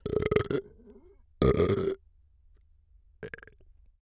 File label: burp; OPENAIR; Anechoic